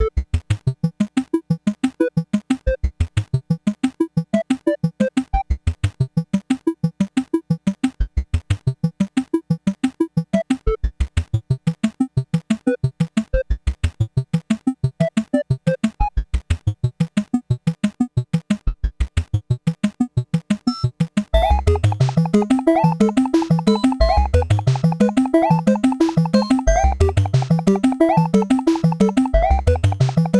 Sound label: 8-Bit; Celeste